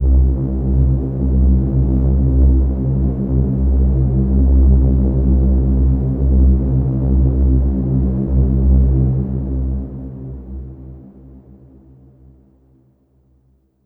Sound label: Downtown Overlay Drone